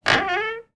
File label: Door Open